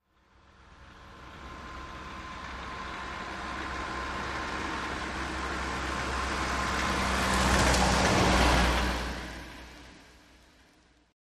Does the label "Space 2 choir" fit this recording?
No